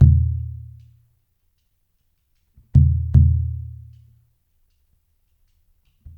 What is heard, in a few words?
DEEP DRUM; Spectrasonics; Liquid Grooves 1 & 2; AF.PLANET